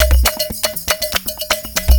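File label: 120 COMBO, drum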